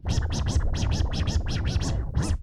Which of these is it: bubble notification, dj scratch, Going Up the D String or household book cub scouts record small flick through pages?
dj scratch